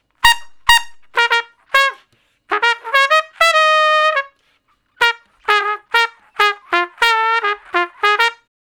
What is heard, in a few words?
Trumpet Straight (Bb); Solos; Crimson Blue and Fabulous Horncraft 4 RnB; Horncraft for R&B